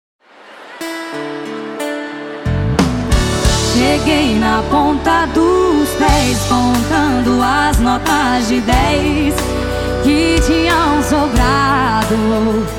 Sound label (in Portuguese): sertanejo sofrencia e romanticas